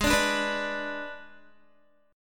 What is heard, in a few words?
Ab Diminished, Guitar; strummed; guitar chord; 15,17,14,16,14,x; JGuitar